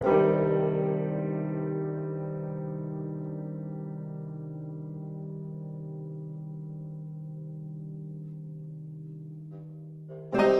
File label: loop Eerie